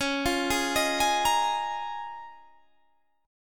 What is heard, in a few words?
C sharp 6th Add 9th C sharp down Guitar Standard; arpeggiated; C#6add9; JGuitar